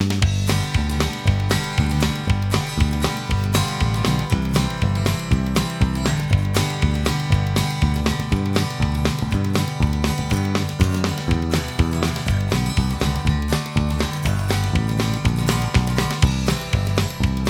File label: delay guitar in mix; audio examples from the book